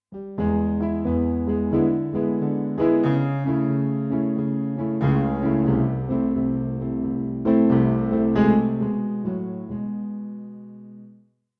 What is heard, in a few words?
Piano; XV-88